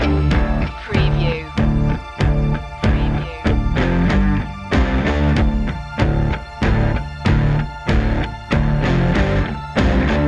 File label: southern thunder loop